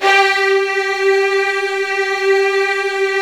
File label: Virtuoso Strings